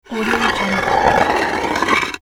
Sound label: slate drag; electronplanet